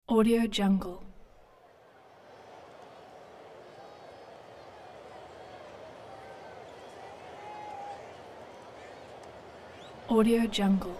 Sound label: baseball crowd ambience